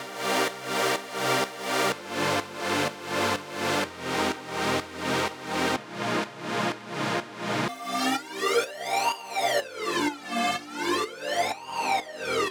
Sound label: Synth Pad; Flow